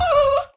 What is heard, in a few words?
Puppy whining